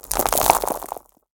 Rock Elemental Death

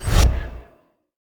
misty step cast; heroes; anya

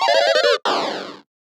fall; sfx; easterEgg; arborean

